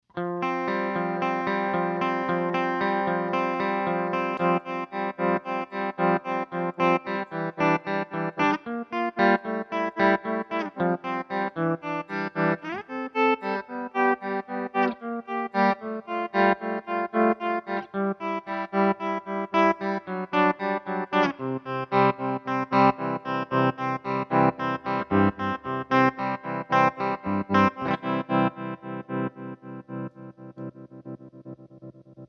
empress tremolo, Rhythm 3, two speed mode, depth full, tube wave setting